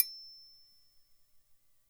TRI MUT, PERC; Sampleheads; NYC Percussionworks; 90 s Sample CDs

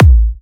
A, kicks; markus hakala kicks

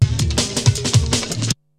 BREAKBEATS, TECHNO

